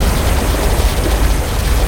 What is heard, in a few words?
waterfall, ambience; ambience racing